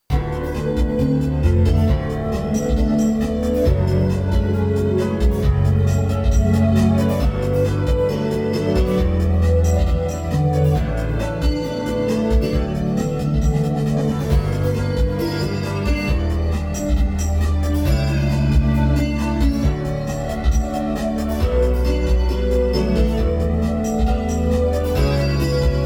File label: piano